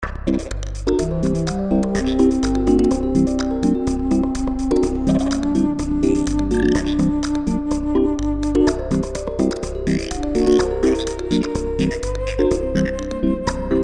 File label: Sing